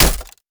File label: impact glass shield; Escape from Tarkov